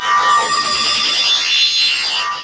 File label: PHASE WAIV; ENVIRN-AMB